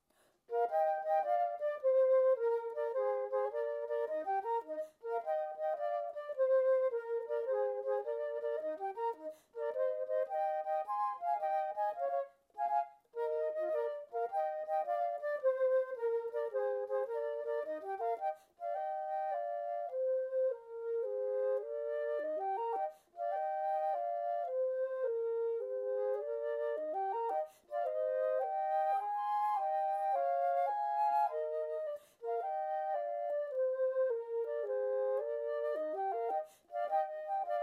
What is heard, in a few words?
trio w percussion; toe ensembles bk 2